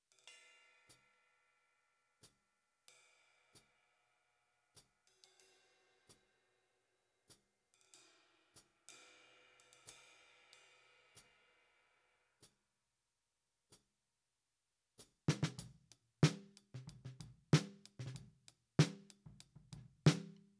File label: Snare Top, 94bpm